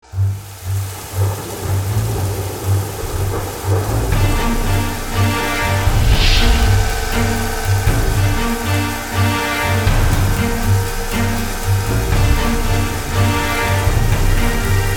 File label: fallen tree; Grim Tales: The Legacy; carall